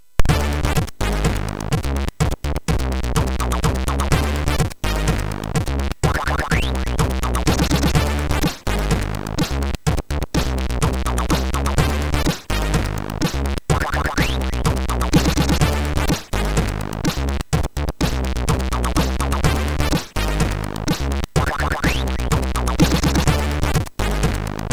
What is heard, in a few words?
Instruments, MOS6581; Rob Hubbard; MOS6581R3; hvsc